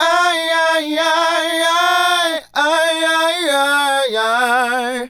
Male Group, Hip-Hop and RnB Vocals

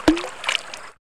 BOTTLES; OMI - Universe of Sounds

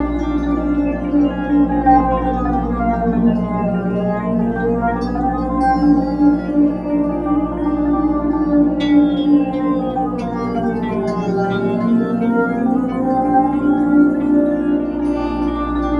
Guitar Textures

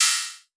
hat2, hithat

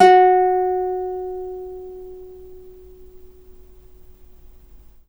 NYLON GTR

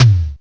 toms; Drum Hits

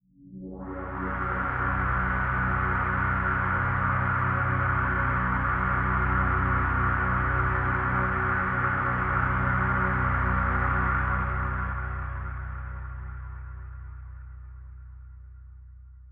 Mist, strings & atmospheres; AMG - Prototype Drum & Bass

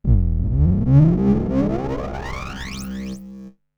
Virus dirty Rise

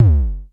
Klone Dual Percussion Synthesiser tom

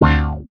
chords

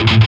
GTR; Roland - LA Composer vol. 2

